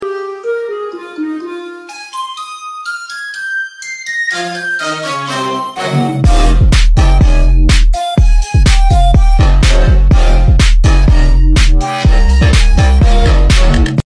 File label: flute remix; tiktok